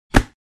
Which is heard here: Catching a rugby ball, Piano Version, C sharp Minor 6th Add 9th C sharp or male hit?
Catching a rugby ball